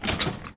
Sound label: Foley